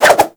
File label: Dagger Spin Whoosh; Warframe; Weapons; Dual Daggers